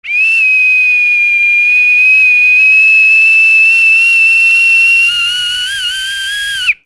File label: WHISTLES